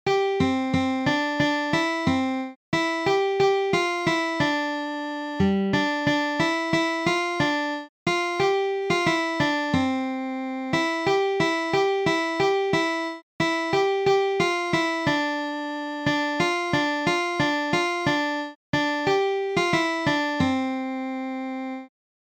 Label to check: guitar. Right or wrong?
Right